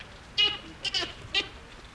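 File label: Papio papio juvenile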